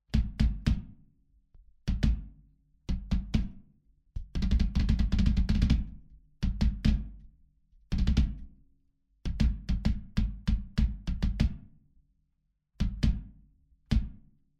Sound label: Fame Fortress BDR 20˝x 16˝ Stereo Out; shellkits